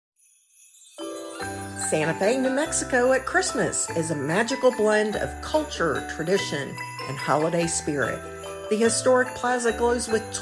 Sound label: Holiday with Music; Santa Fe